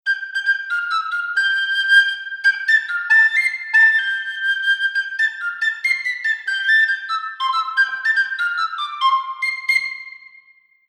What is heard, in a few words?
whistle; Shire Whistle